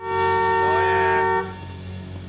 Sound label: honking